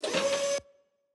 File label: robot hydraulic up; boss